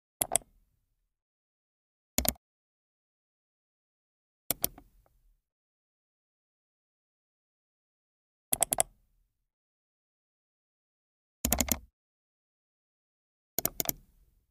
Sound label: Mouse Click